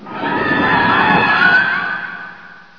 humans screaming; ambience; rebar

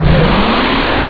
air push

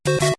tune

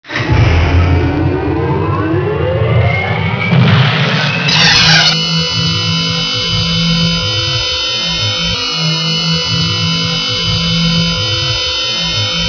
psycho motor startup

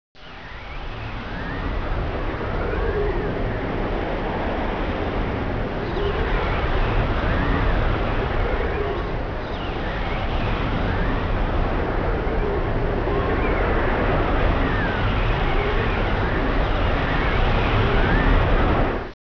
rebar wind; ambience

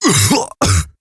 cough; player

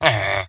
villager

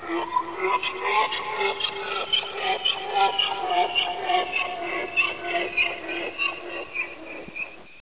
alien cry distant; ambience

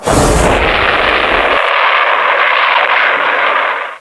missile battery; ambience

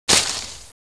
brush movement; ambience

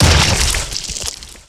body splat; player

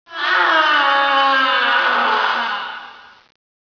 m scream

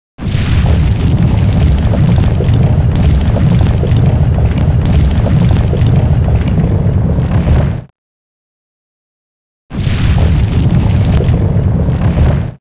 stone move

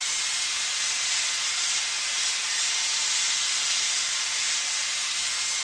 amb steam

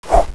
throw, snowball

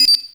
beep

